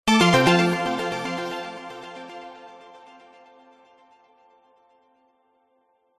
game show musical jingle time up round digital synth